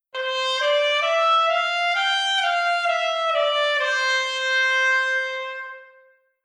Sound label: legato